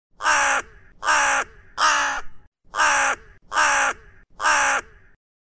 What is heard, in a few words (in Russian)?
zvuki voronov i voron